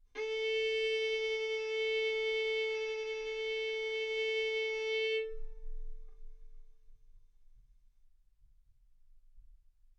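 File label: Violin arco mf A string A4 B4 stereo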